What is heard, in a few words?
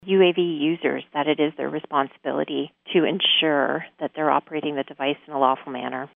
Drone